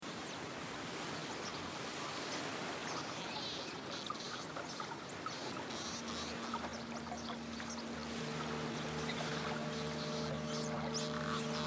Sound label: didjeridoo